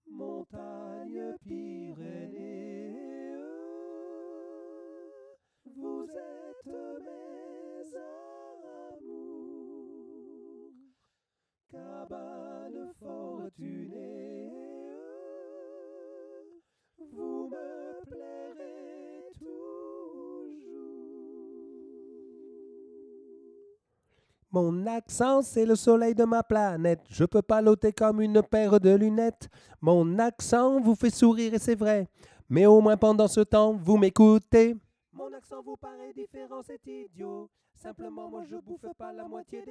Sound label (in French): basse